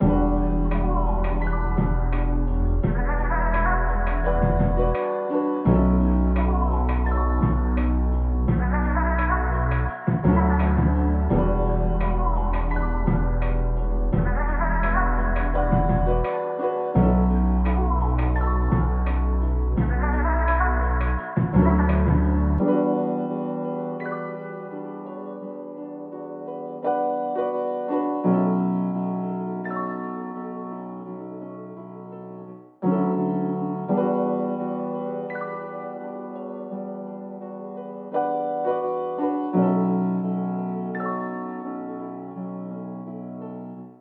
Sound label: 170 BPM B Min, Melody Loops; Cymatics; Orchid Sample Pack